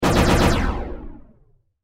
Science Fiction Laser Cannon Four Shots Gun

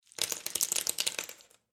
Food Pistachio Set Down On Kitchen Counter Nuts; Food Sound Effects; SFX Bible 6

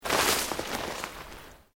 PLANT LEAVES SMALL MOVE SLOW, rustling

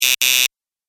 Quick Buzzer Double Press; Ring

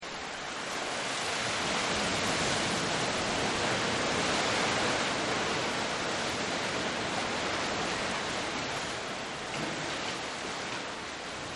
Surf Into Cave; Ocean And Sea; Partners In Rhyme

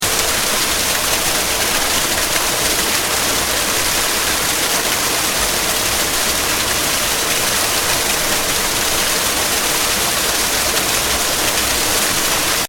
Waterfall Fast Splattery, Splattery waterfall; good definition; Can also be a water surge, water flow; Seamlessly loop; Water Sound Effects

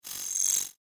Subtle Spell Fantasy; Magic Sound Effects; Andrew Fleming

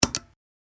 Foley Metal Switcher; Foley Fx - Metal Switcher; Switch Sound Effects

